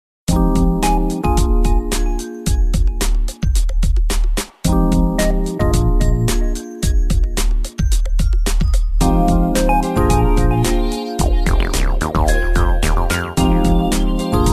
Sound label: alcatel ringtones; alcatel silhouett